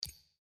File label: button left; endfield; gacha